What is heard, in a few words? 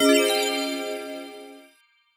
news title